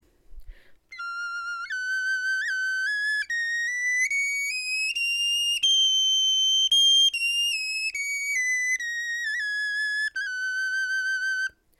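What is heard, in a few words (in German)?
tonleiter, Knochenflöten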